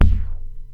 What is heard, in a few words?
Vinyl Bumpkick